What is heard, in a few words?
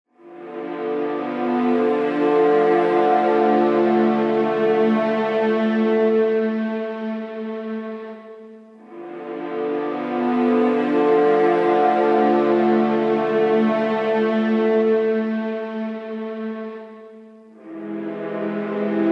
instrumental